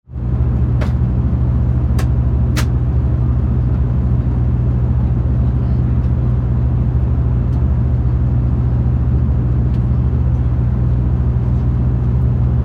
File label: turboprop cabin noise